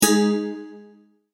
multimedia tone exclamation; McKinney Sound

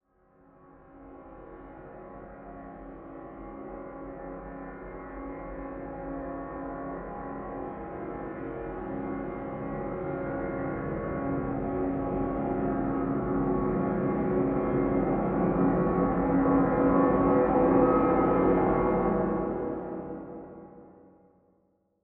Horror Drone Dark Swirls Swell Crescendo; Scary Tones; Blastwave

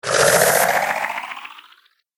Whoosh Vibrate The Air; Swish, Zoom, Swipe, Whoosh, Swoop; Andrew Fleming

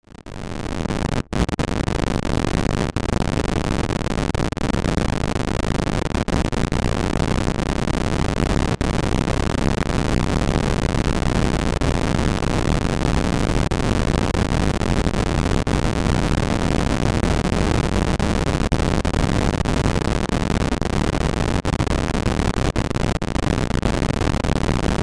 Drone Hard Static Crackle; Blastwave

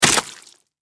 Impact Mud Hit; Miscellaneous Impact Sound Effects; Miscellaneous Impact (Sound Effects:Impacts)

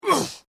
Pain Umph, Male Painful Grunt, Hurt

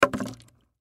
Bottle Set Down, Bottle Set Down On Water; Objects; Foley; Blastwave

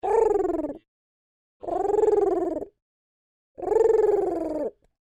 Creature Oodle Bird Calls Peaceful; Birds; Animals

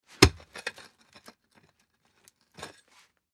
Pick Axe Into Dirt Dig Pickaxe; Pick Axe Into Dirt Remove Dig Pickaxe; Yard Tool; Industry; Yard Tools; Blastwave

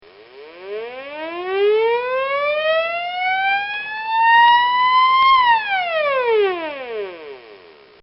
Boat Coast Guard Siren

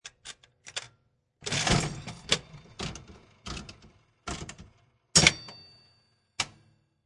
Slot Machine Insert Coin Handle Pull And Wheels; Casino, gambling, Slot Machine. Insert coin, pull handle, wheels start, spin and stop; Lynne Music

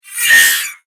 Android High Pitch Whoosh, robot

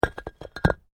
PAVING STONE FALL

